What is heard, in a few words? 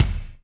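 Kick Chopped, Alesis D4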